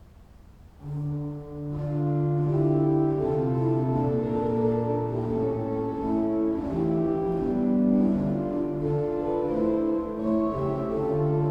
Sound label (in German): Krummhorn 8, Kirche Thalwil Kuhnorgel